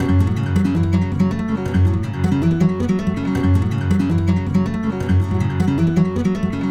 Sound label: Fast N Loose, Acoustic String; Sonic Foundry (Sony Creative Software); Friendly Fire